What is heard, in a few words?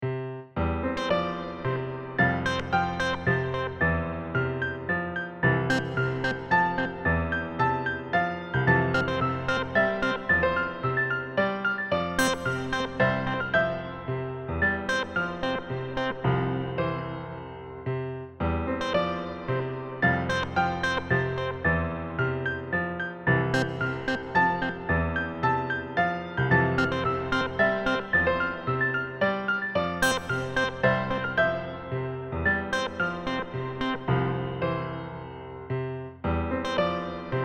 keys